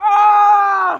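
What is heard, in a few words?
Death Screams Male, battle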